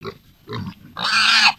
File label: Pet Warthog Idle